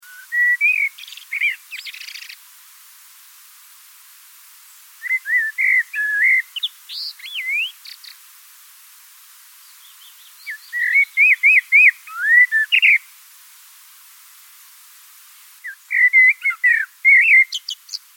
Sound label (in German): amsel